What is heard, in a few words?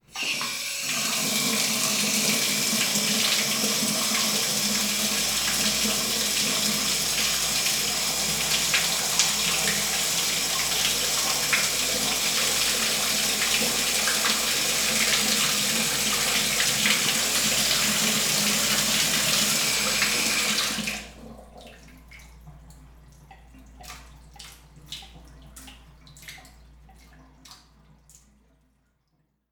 Bathtub Faucet